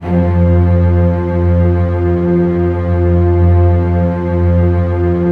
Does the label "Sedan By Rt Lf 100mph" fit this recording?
No